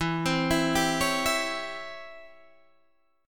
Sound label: Db Minor Major 7th Flat 5th E